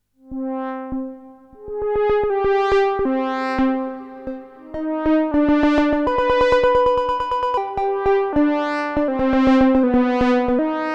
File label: Moog Sound Studio Patch Kingdom Osc Mother 32 DFAM